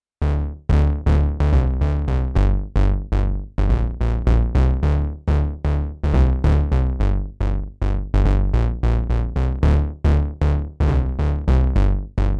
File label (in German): Moog Bass Saw und Triangle